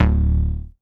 Night Bass; Big Fish Audio; Old Skool House; OSH Bass

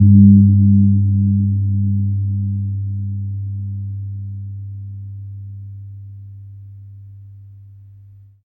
KEMPUR, KEMPUR GONG; Spectrasonics; Heart of Asia

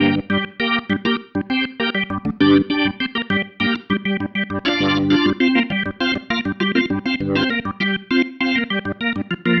Pattern, Organ

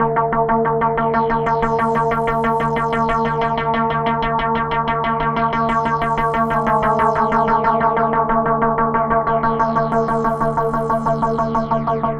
synth arp; Electrocution

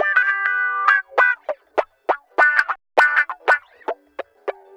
Guitar Loop; Ambient Grooves

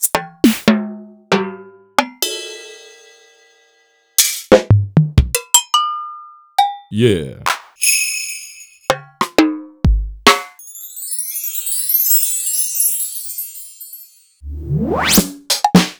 Roland SPD-S; m8

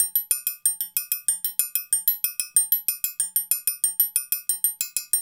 Udu Calypso

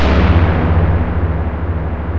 CAVE, SPATIAL; East West - Global Sounds

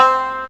Banjo C5, Fairlight CMI IIe, Guitars

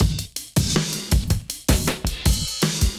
Toker, Drum Loops, Compressed; Zero-G Smoke

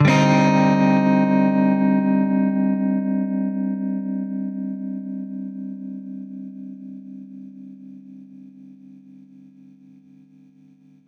Baritone Chord Cmin[add9], Guitar Chords; undercover samples

